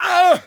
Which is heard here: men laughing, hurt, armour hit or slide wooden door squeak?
hurt